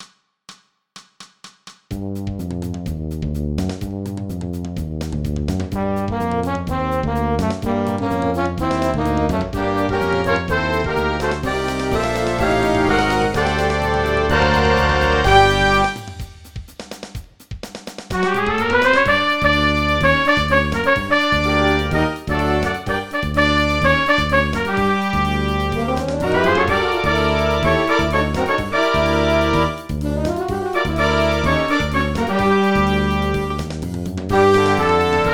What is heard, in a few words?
big noise; marching honor; honor bands